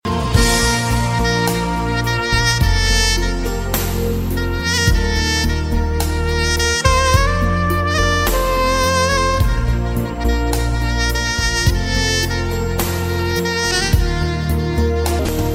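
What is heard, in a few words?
saxophone; classical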